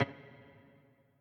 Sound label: piano riff intro